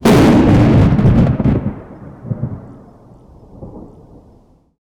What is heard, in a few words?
thunderclap big wide ster; electric